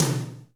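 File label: GATE tom; Crypton - DrumBase5000; GATE KITS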